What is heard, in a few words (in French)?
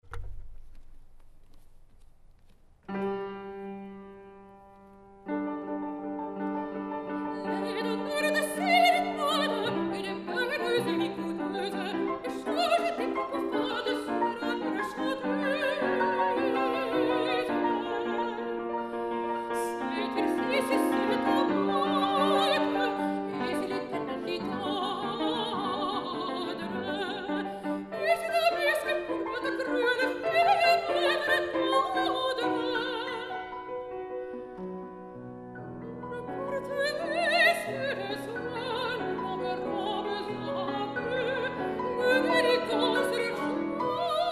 Mandoline